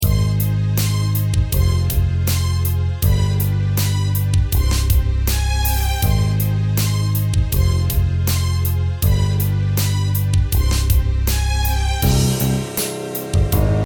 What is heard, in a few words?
hiphop; demo